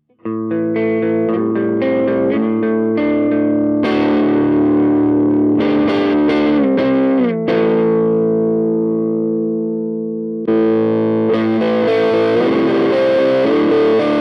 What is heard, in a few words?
Compadre dirty Boost Mid both